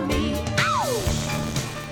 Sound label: NOISE